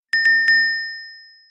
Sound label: 3 Tone Notification